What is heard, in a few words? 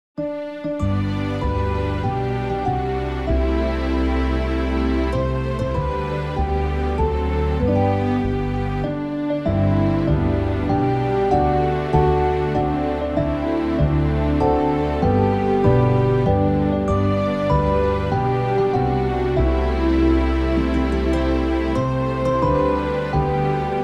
happy